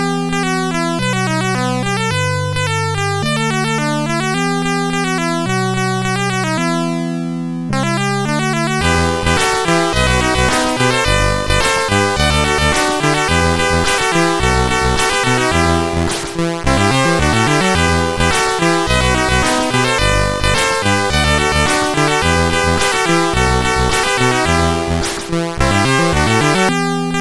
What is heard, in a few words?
Old Favourites, sid CSG8580R5